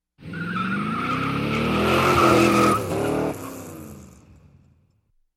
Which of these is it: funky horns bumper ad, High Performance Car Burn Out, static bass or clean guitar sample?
High Performance Car Burn Out